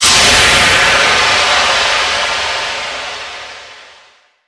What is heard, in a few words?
Steam